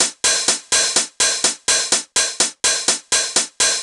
drum hardcore hat 125, Drum Loops, Classic Chicago House